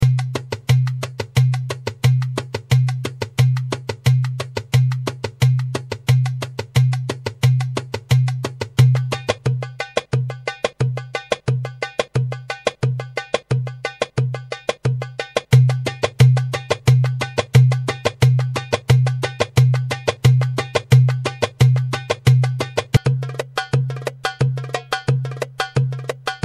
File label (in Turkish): trakya darbukalar; roland gw8; ritim